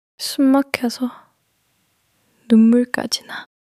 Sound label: crying